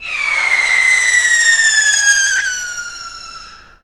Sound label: whistle, skyrocket; xbmc4xbox; screensavers; rsxs-0.9